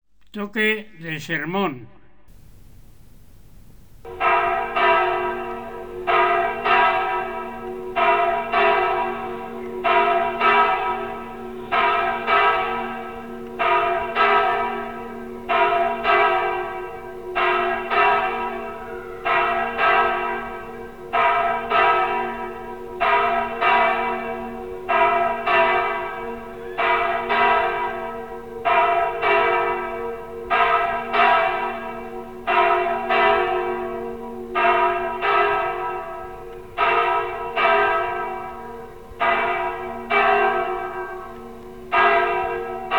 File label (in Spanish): Toque de Sermon, Campanas